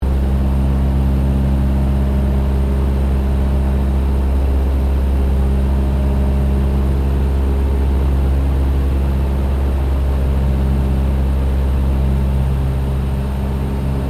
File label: AIRCRAFT LOCKHEED HUDSON FLYING INTERIOR, Hudson twin engine light bomber; 1941; Airplanes (Sound Effects:Vehicles)